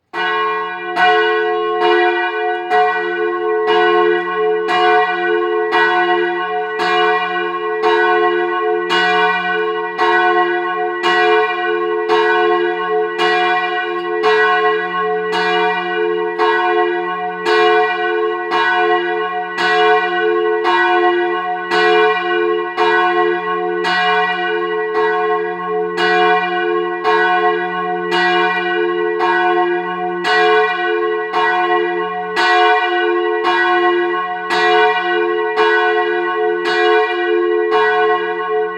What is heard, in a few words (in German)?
Glocke, Reformierte Pfarrkirche; St. Blasius Ziefen; die glocken